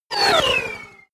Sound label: sci-fi laser shot long; krieg-waffen; soundpool